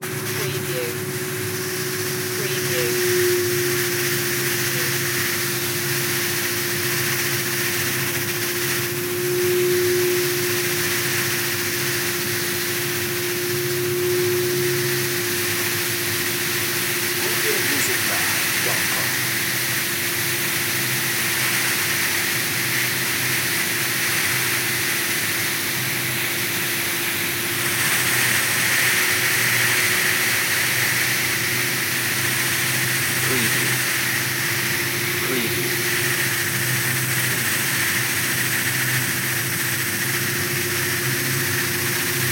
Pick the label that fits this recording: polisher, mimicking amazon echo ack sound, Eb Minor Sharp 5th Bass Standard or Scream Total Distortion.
polisher